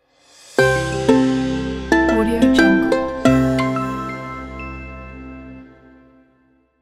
cheerful vintage logo

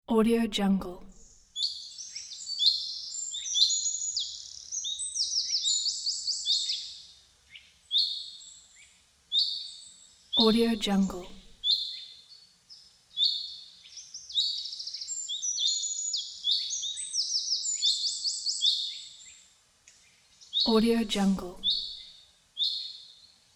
morning forest birds

